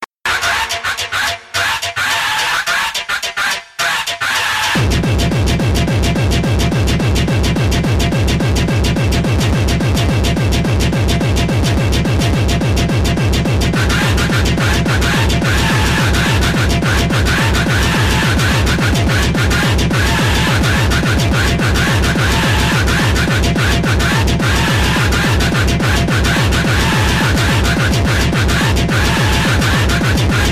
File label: scream